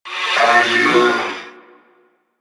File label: Purgatory Warrior Charge Scream, Barks; Warframe